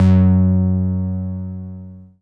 bass, Dance Mega Hip Hop Electro; EastWest

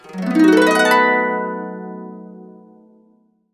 B, Harps; Complete Harps; USB Soundscan vol.62; AKAI

